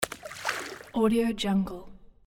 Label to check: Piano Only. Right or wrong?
Wrong